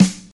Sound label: phat snare sample f sharp key, snare drum sound tuned to the F# note